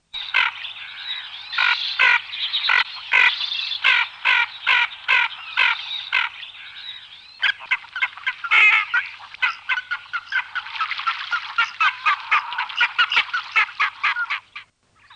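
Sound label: Ferruginous duck; Madarak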